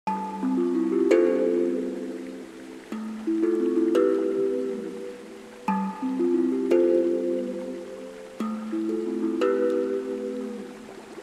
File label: Tang drum